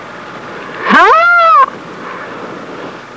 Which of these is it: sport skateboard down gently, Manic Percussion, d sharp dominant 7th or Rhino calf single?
Rhino calf single